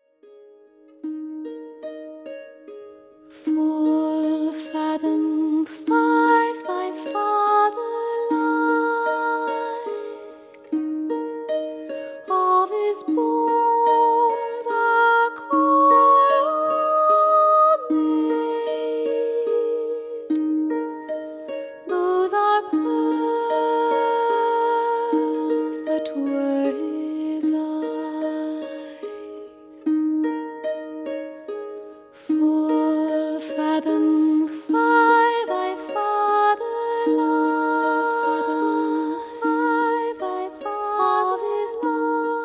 bgm

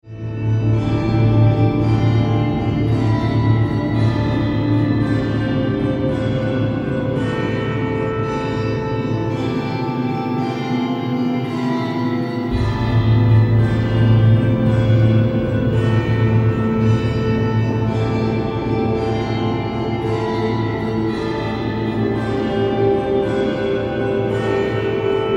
bgm

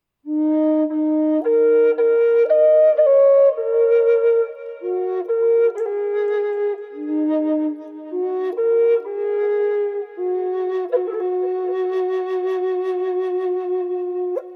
bokota

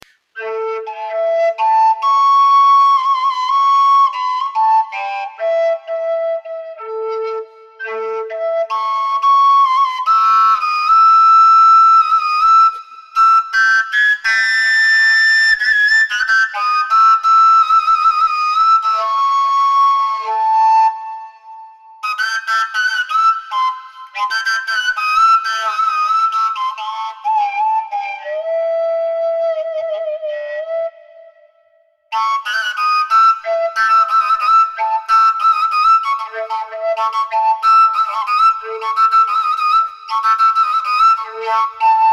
overtone long; 指穴が一つもない笛です。; コンチョーヴカ（LowＡ）を吹いてみました; LowＡ管

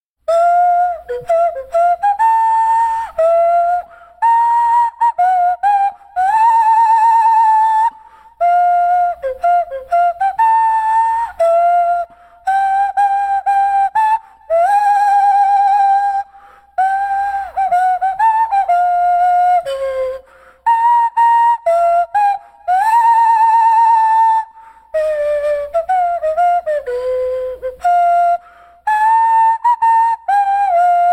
toy nose flute

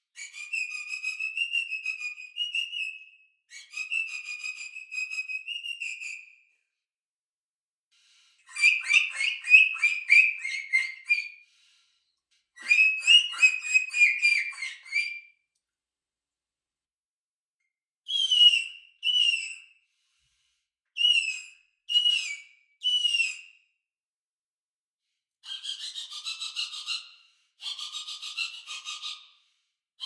bird whistle; バードホイッスルの試奏-４種類の野鳥; オランダからやって来た鳥寄せ笛です、小さいのに高性能。; いろんな鳥の声を真似ることができます。

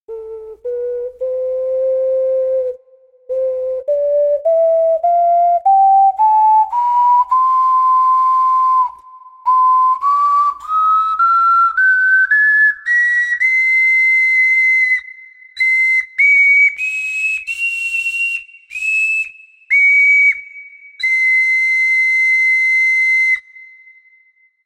ultra dbl scale; ウルトラ・ダブルオカリナの音域（下のラから上の上のファまで）; 音域２オクターブ半のダブルオカリナ（改良型）です。

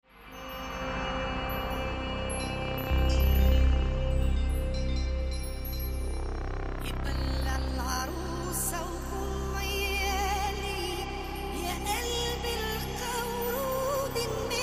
bgm

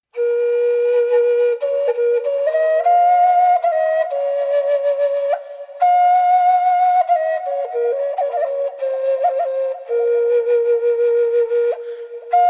bone flute

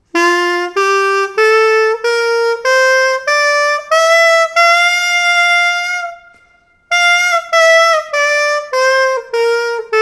pocketsax

